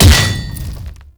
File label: Hammer Glass Impact Default B; Warframe; Weapons